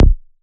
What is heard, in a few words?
Ashes Bass D